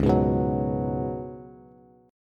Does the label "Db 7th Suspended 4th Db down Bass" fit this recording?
Yes